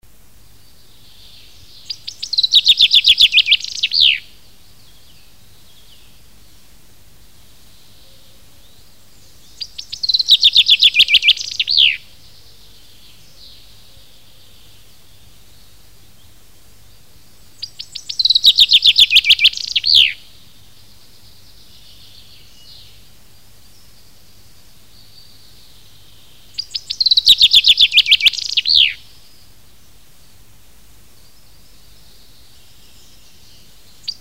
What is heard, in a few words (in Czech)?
Penkava obecna